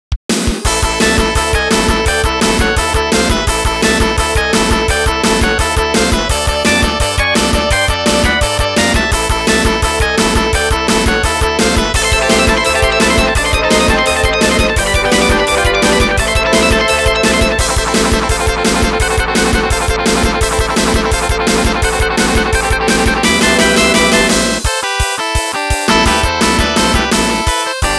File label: Boss Guitar Style; MMX; Maverick